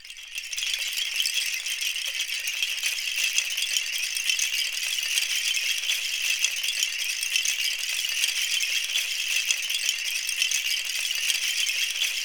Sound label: BAMBOO, Percussion; ILIO; Synclavier Percussion 2; World & Orchestral